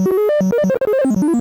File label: troubled ingle; Undertale